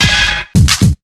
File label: stab included, Drum loops, Kick Snare